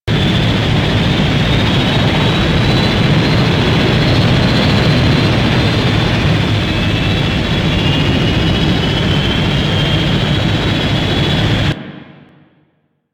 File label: Dropship Hover Loop; Warframe; Vehicles; Grineer Drop Ship